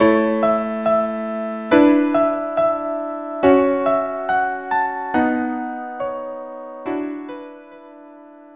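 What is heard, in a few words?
violin duo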